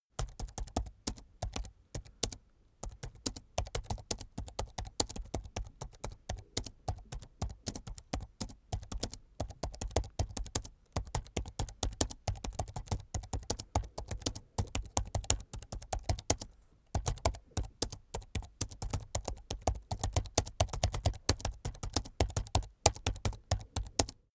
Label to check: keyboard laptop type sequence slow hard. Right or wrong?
Right